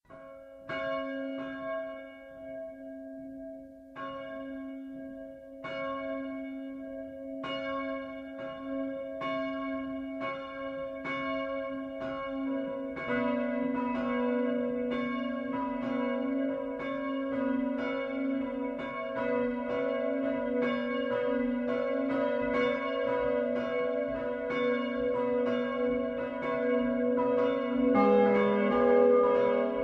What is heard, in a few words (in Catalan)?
Campanes fragment